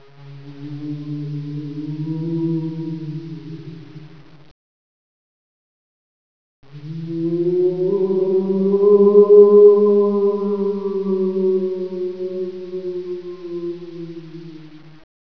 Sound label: ghost woo